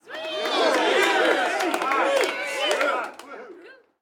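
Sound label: Street Ball Crowd Cheer Medium; Crowds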